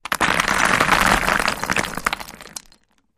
Rock Cinder Block Deb, rocks & dirt

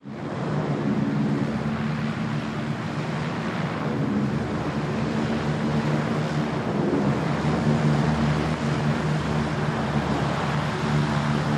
landing, hovercraft; lcac

